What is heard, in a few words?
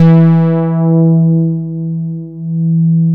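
MODULAR, bass, Drums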